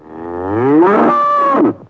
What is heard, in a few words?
BULL; animal; animal fun